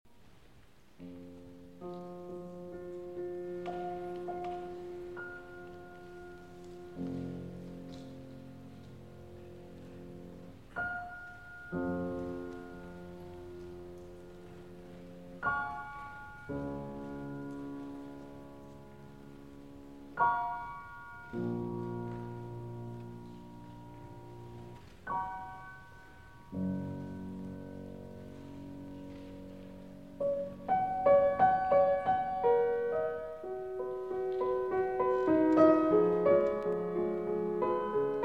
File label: Piano Sonata No. 29 in B-Flat Major, Introduzione. Largo; Op. 106; Hammerklavier; Fuga